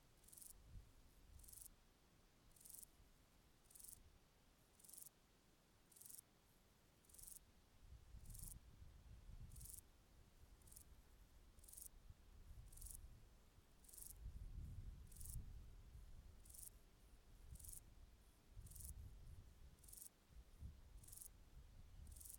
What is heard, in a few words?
Euchorthippus declivus, orthoptera